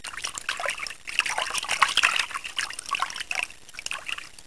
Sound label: rough Water; The Fishin' Hole